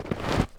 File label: snow footstep; minetest; pedology